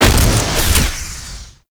Flak Cannon Alt Fire; UT Weapons

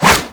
Whoosh, Weapons; Infested; Coda Hirudo

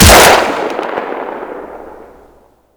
Drone Machinegun Fire; Warframe; Weapons; Vay Hek

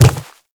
Infested Footsteps Quadruped Medium Walk Dirt; Warframe

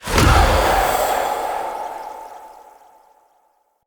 Rage Impact; Fey; Founders Champion

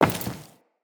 Walk Metal, Footsteps; Characters; Enemy; LadyDeora

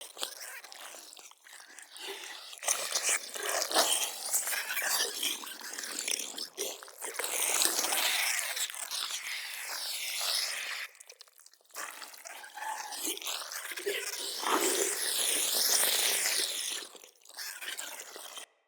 Critter Loop, Root Cave Ambience; Neathuns

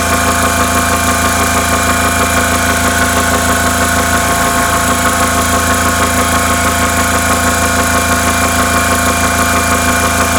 Injection Arm Mover Loop; Ambience; Warframe; Grineer Ocean; Ocean Sabotage Injection

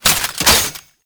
Shotgun Reload; Warframe; Tenno; Alchemist

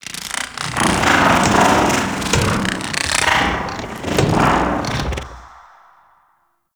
Get Up; Warframe; Teralyst; Ropalolyst

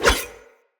Dagger Melee Whoosh; Weapons; Player; Swords

